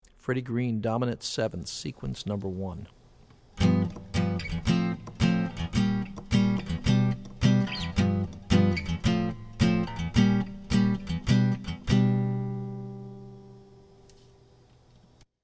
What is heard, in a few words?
D mnt 7ths Seq; jazz chording